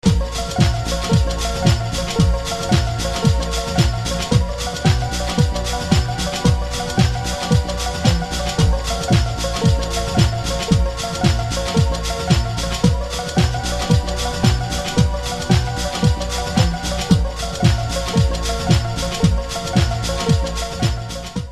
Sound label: remix ringtone; akte x